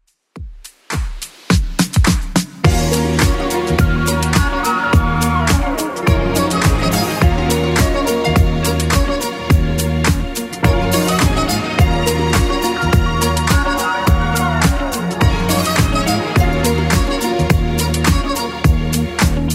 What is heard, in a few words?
80s Club 105 bpm CLEAN